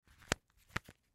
Wallet Leather Close; Click; Objects; Foley; Blastwave

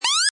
Cartoon Laser, Comedy or retro funny lazer shot; Comedy/Cartoon; Funny Sounds

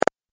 Double Click Sound

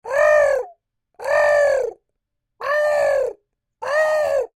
Creature Oodle Bird Call Outs; Lite, Low; Birds; Animals

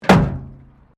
METAL BIN IMPACT PLASTIC CRASH BARRIER

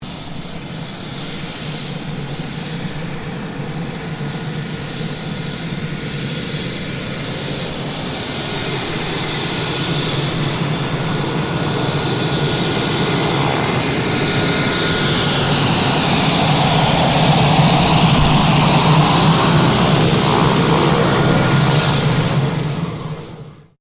AIRCRAFT PC-9A TAXI; PC-9 Military Trainer; Airplanes; Vehicles

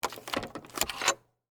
Hotel Door Key Card, Insert, Unlock; Foley

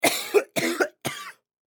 Human Male Cough; Sick Sound Effects; Sick (Sound Effects:Human)

